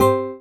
PUZZLE Success Guitar Very Fast Two Note Climb Dry; stereo; Universal Sound FX